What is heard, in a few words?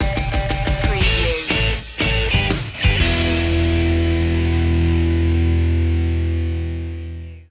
monster truck stinger